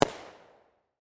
Firework Explode, Bang; Fire, Firework, Explosion, Pop, Bang; Fireworks; BlastWave

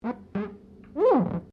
GLASS SQUEAK FINGER; Material components for constructing more complex sounds; Materials; Foundation

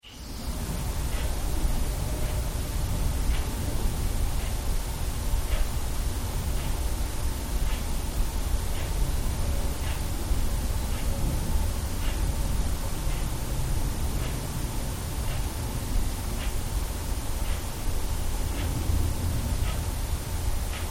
Empty Room Tone, Very background clock tick; good buzz for post sync cover

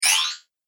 Magic Power Up; Magic Sound Effects

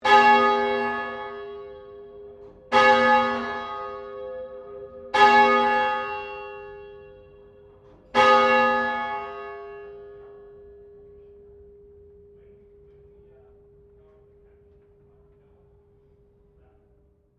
Bell St Pauls Cathedral Melbourne Bell 2 Rings; The bells of St Pauls in Melbourne; Bell2 4 Rings; Musical

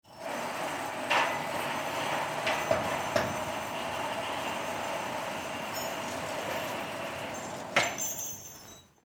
grand curtain, Stage Curtain Opened; Theaters

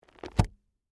Bible Close Cover, Book, On, Table; Foley; Books; Office; BlastWave

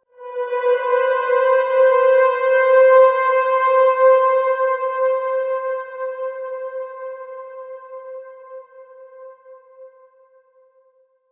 Cathedral Fanfare; Musical

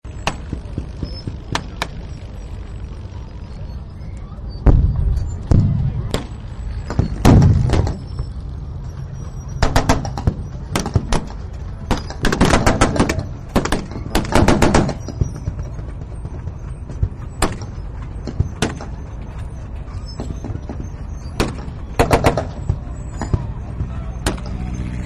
Battle Ambience, Field Fire; Battlefields; War

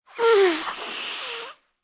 Human, Blowing Nose, Male; Sick

